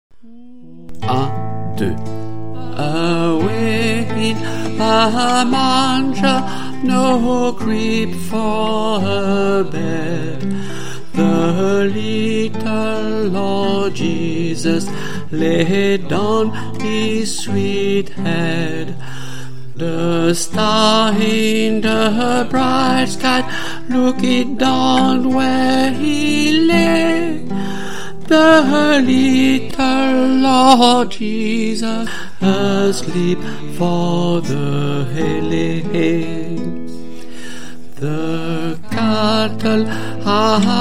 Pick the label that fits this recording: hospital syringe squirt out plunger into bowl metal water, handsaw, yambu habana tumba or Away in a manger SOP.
Away in a manger SOP